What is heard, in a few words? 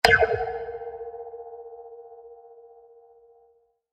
Laser Impact; Digital; Impacts; CraftedSignals